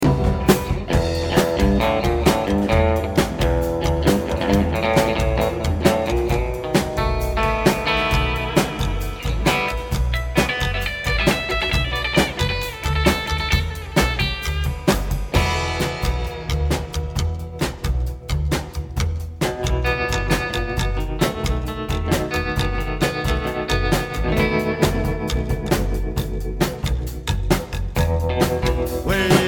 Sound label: rockabily